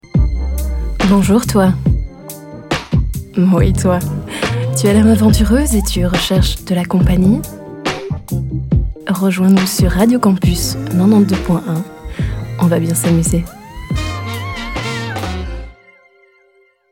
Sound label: lounge session